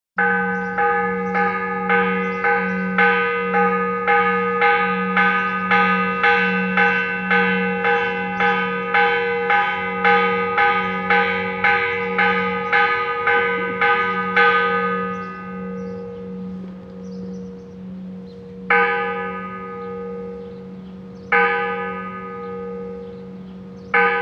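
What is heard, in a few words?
Church bell chime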